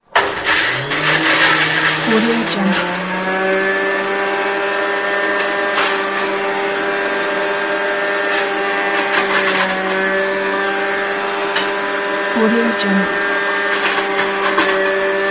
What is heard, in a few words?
juicer